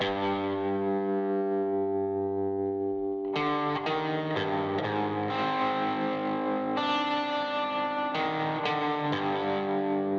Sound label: el guitar